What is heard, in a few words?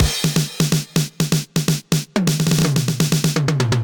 707 125 Fill, Drum Machines; Ableton